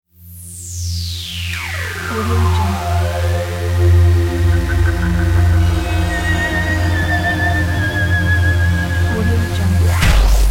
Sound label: scary logo